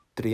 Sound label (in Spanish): Triangulo (Triangle)